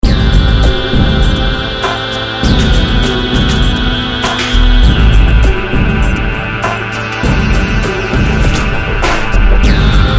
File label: loop